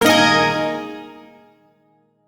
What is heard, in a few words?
Shawzin Yo Chord; Warframe; Duviri